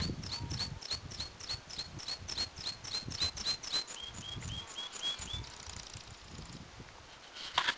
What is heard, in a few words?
Meadow pipit